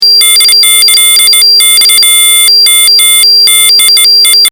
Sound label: fm synths